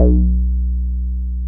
B1, Bass; E-MU Producer Series Vol. 6 – Analogia Project (CD 1); Boom da Bass 2

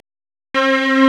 STRNG; CMP - Analogon

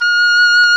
OBOE; Roland - LA Composer vol. 1

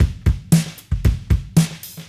Cruisin' Disco Drums, 70s Analog Funk

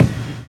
live kick; Univers Sons - Kitch 70s

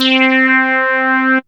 SWEEP C3; Best Service Alpha Dance vol.2

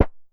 Perc Hit, Noise

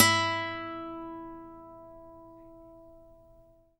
Nylon Guitar

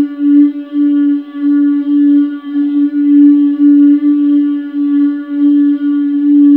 LOMONIOUS, HARMONIOUS; Spectrasonic Distorted Reality 1

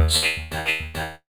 FX VOX; AMG - Vince Clarke Lucky Bastard

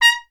TP A#; Miroslav Vitous; Solo Instruments VOL-1

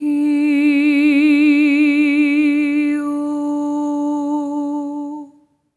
EH OH D4, SOP; Spectrasonics; Symphony of Voices

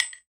Little Clank, household rhythm samples Hits

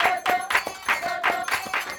120 CHANT

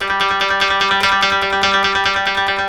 KOTO TREM

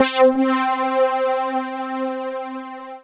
Synths Note C

